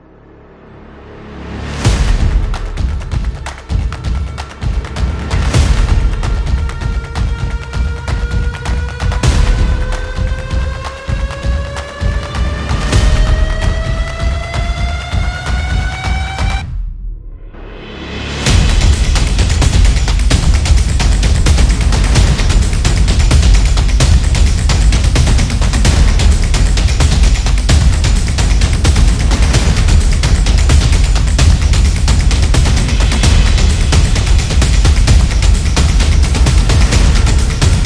Epic Drum; suspense

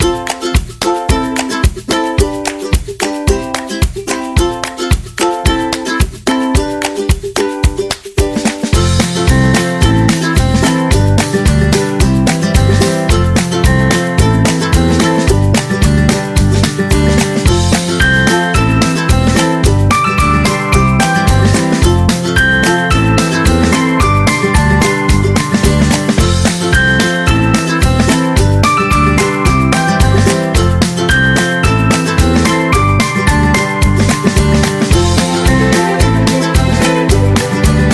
Food Commercial; cooking show

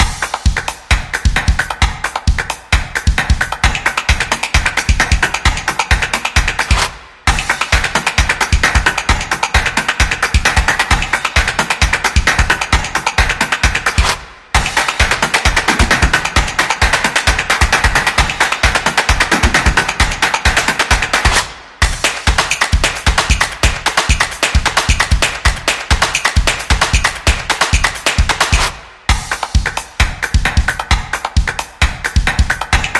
Drum Clap; sports

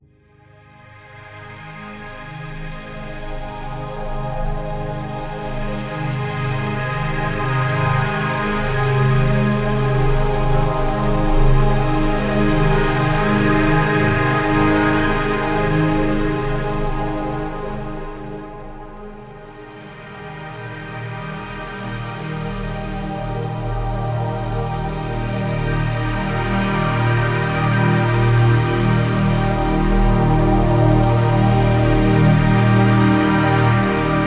Zen Music

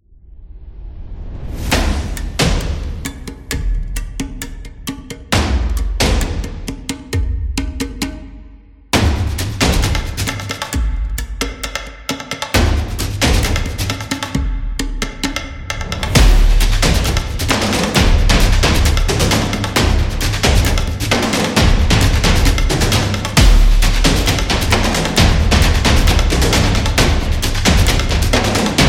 The Tribal Drums; tribal dance

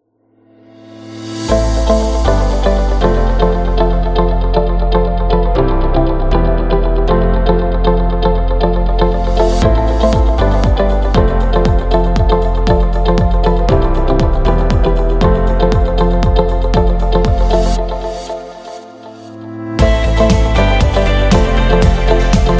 Background Music; motivational speech

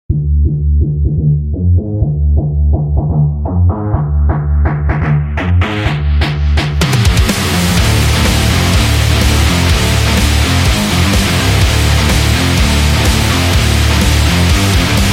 Metal; gym rock

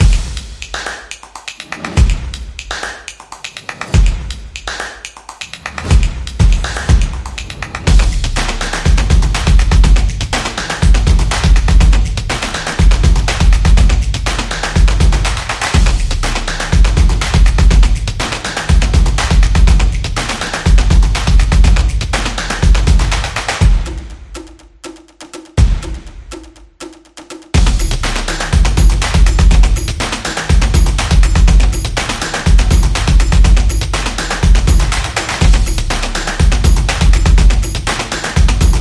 Karate, sport drums

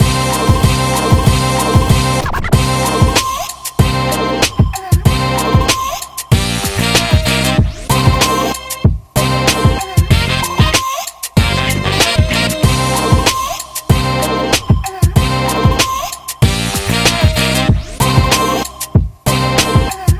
The Hip Hop

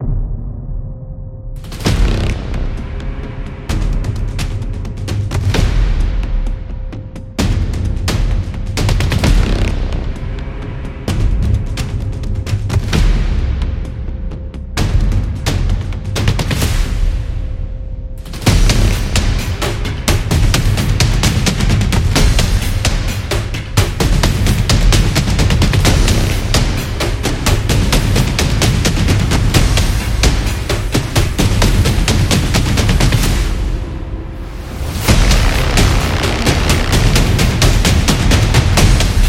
Military Drums

